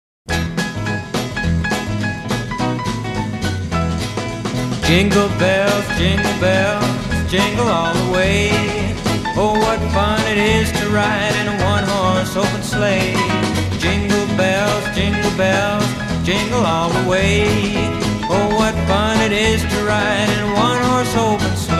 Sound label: Jingle Bells; rockabilly; CHRISTMAS; COUNTRY